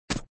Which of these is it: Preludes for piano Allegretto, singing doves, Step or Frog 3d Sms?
Step